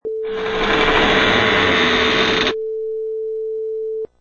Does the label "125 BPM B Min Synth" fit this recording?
No